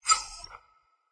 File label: dog whine; smite; scylla